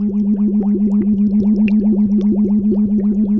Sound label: voice MID